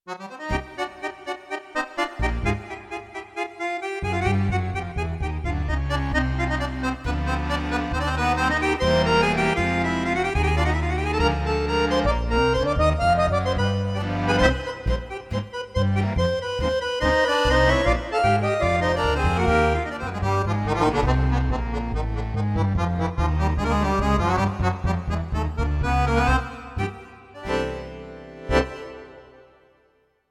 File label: Tango, Roland V-Accordion; Cavagnolo